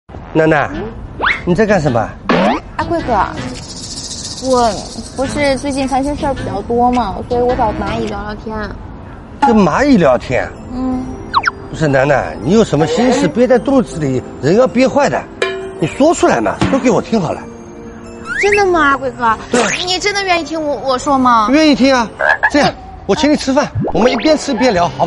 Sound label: laugh; breadradio